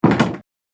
Door Shut; Door Closing

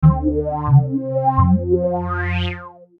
Logo Bass Wah; Sonic Logo Sound Effects; Production Element Imaging Element Accent Transition

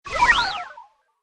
Fast Droid Flyby

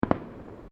Fireworks Multiple Firecrackers; Backgrounds; Crafted Signals

